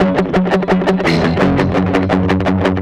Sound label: Guitar, Trip-Hop Loops